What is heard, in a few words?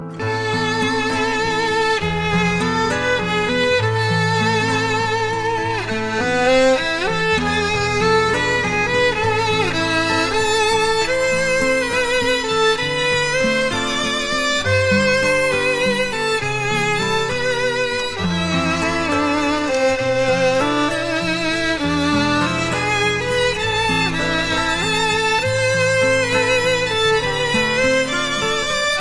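Violin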